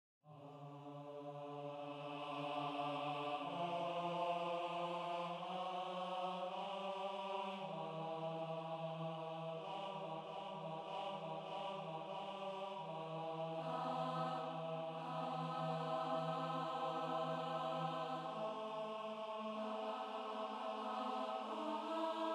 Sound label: Choir Micro